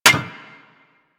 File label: Melee Staff Impact Generic